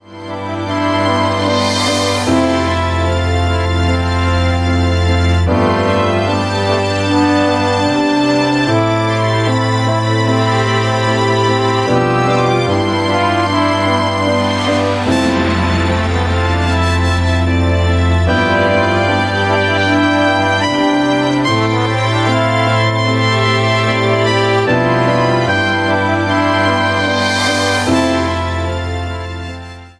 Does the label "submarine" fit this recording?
No